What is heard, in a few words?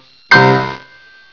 synthe; de rats theatre